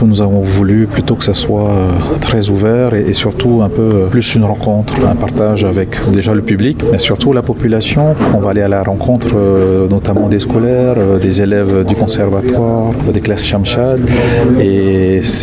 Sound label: ukulele